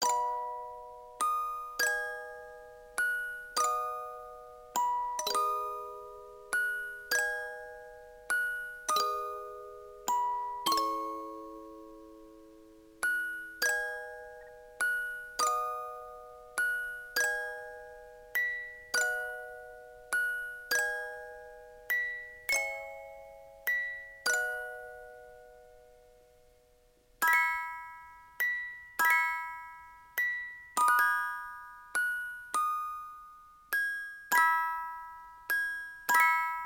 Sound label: musicbox